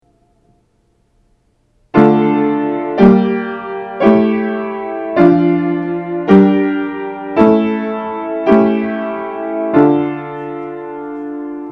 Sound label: Harmonizing a Melody Aural Training exercise